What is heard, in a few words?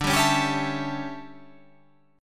D Major 7th Suspended 2nd Suspended 4th D